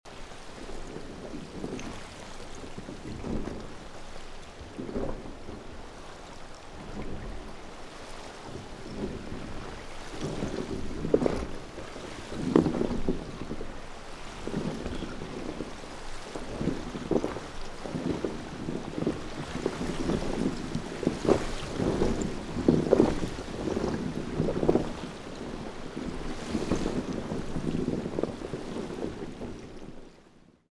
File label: ice slosh; Monaco